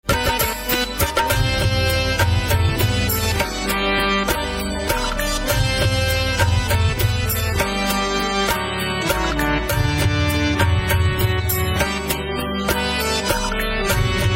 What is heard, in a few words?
Harmonium